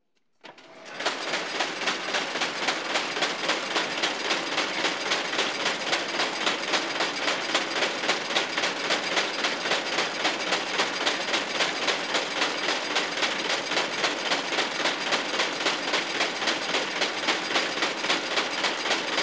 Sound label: carding machine loom